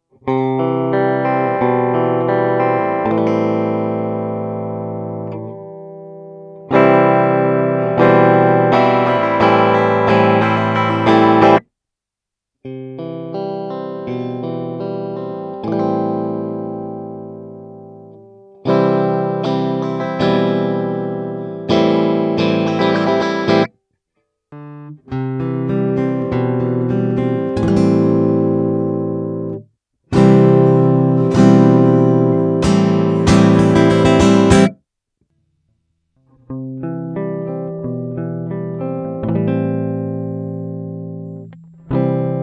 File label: guitar; hikaku